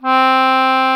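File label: CONCERTINA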